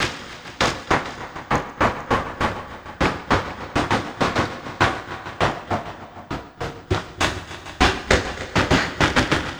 Envelope Beat; Drums & Drones

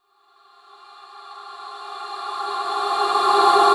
Reverse Vocals Fmin; Vengeance - Essential Clubsounds Vol.4; Effects

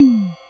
Rhythm Tom Ride, Realistic Concertmate-670; Rhythm Layered Kit

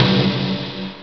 hall snare; ST-XX Amiga Soundtracker Sample Packs

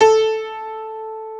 G3 R+R PNO; Keith Emerson Signature Series

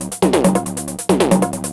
drm 138, Techno, drum-loops; Techno ID

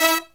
HIGH HIT, Brass Riffs

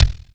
Acoustic Kick w Amp Mod; Drums; Kicks

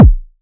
Attack Kick

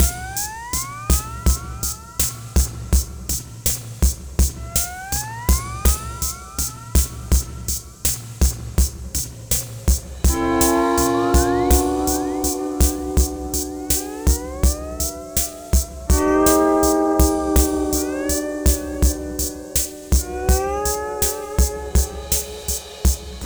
Sunrise Full-F#, Construction Kitz Lounge; Zero-G Kocktail Kollection; Sunrise Mixes 082

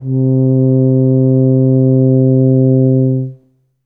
tub C2, Tuba; Best Service ProSamples vol.51 - Classic Orchestra 2

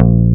BASS A#1, AC BASS, AKAI S6000; AKAI S6000 CD-ROM - Volume 1

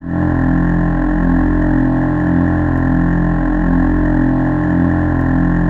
choir F; Best Service ProSamples vol.55 - Retro Sampler; AKAI

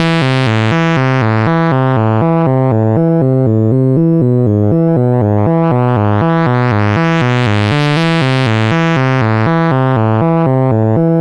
C64 Bass, Commodore 64, Chiptune

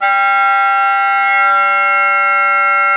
OBOE3; Xsample VOL-6

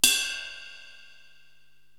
Ride Jazz; Korg TR-Rack

